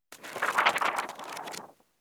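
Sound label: turning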